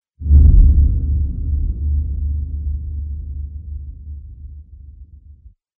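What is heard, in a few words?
cinema transition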